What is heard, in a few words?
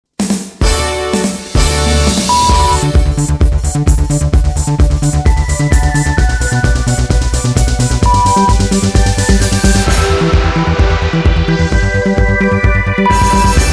Techno 130